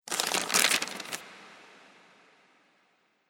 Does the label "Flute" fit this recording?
No